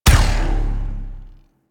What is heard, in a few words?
Rail Jack Weapon Two Shot; Modular Weapons